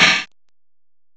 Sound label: HH CLOSE, CYMBALS; M8; CMI Factory